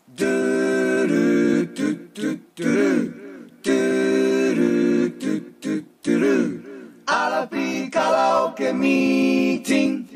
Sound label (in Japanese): ジングルロング セリフなし